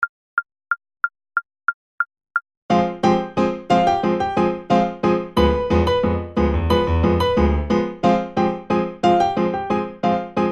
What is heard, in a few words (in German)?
Charleston 90; Seiber